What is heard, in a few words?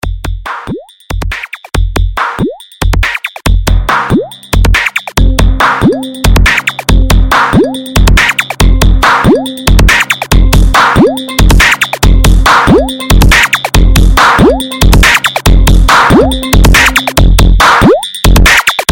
dj nonstop electronica